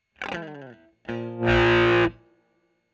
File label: E.GTR Royer OD